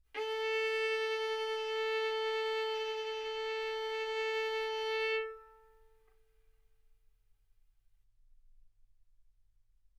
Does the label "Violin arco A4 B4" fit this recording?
Yes